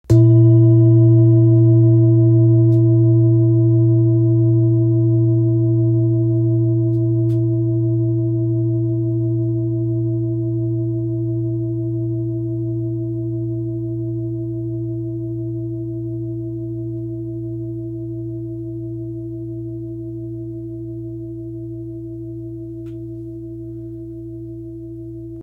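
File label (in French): bol therapeute fa, bols chantants tibétains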